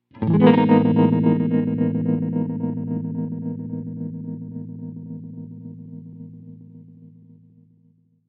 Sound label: Tremolo Triangle auto-pan